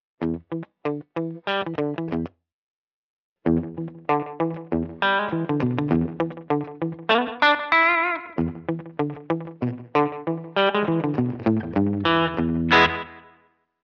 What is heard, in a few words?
Multi Slap